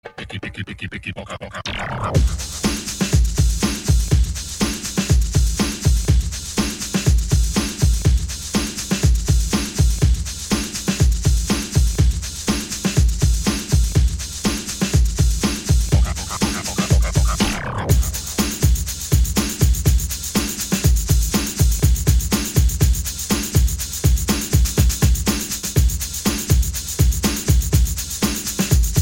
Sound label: Drums